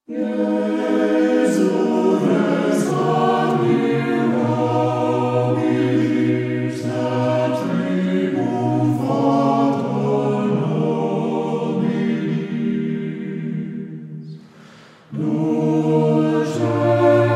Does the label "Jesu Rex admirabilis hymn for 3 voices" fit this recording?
Yes